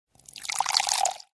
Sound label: drink Tea; uno